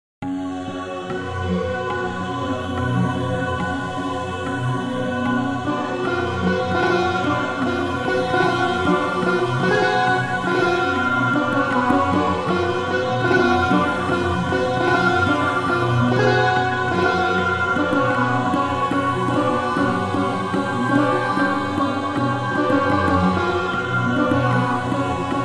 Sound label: sitar